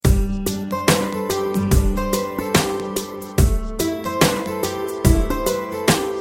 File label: Guitar Msg